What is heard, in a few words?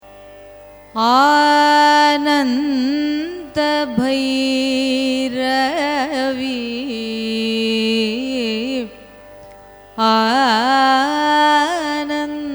raga ananda bhairavi signature; carnatic music